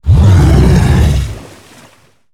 Attack

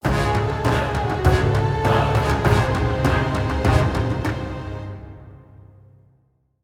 Legendary Stinger; Mankrik

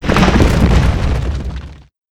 Earth Elemental Enter Play

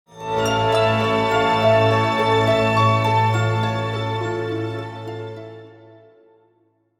Play Stinger

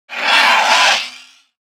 Twilight Whelp Enter Play

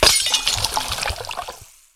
Potion Underlay Death

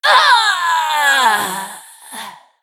Female Human Death

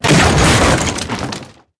Cart Death Underlay

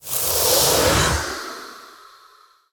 Magic Broomstick Play

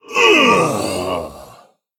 Male Human Death

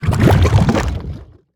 Enter Play

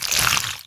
Death Underlay

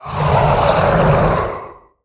Kabal Demon Play

